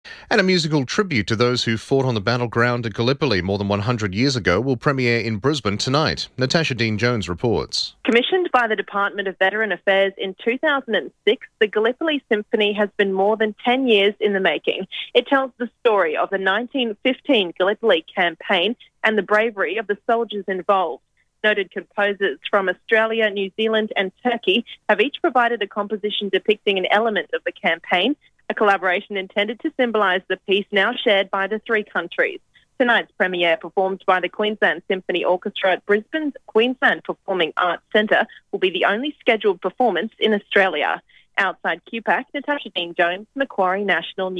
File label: symphony; Gallipoli